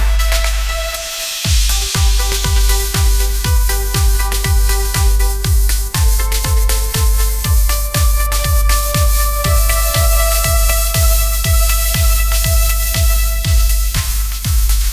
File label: upbeat pop melody, ringtones; ai-generated